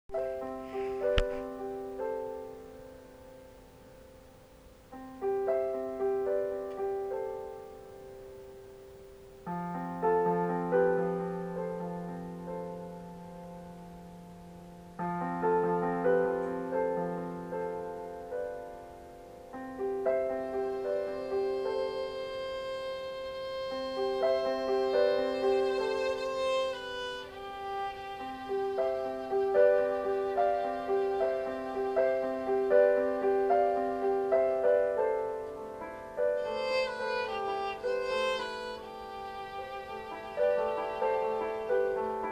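violin improvisation, improvising on violin with improvising on piano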